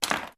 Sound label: Objects Fall Various